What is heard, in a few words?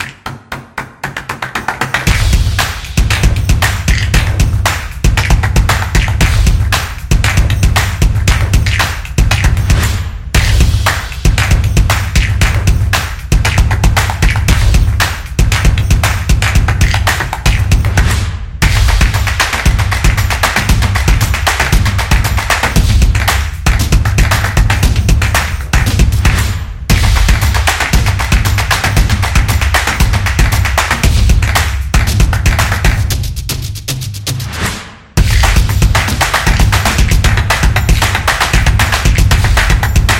Rhythm